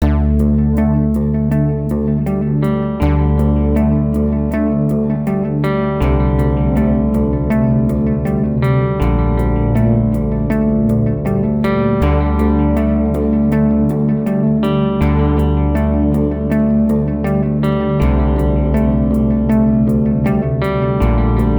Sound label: pop